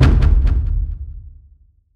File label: strider steps lfe; creatures